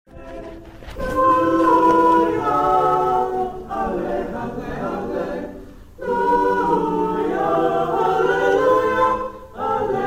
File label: singers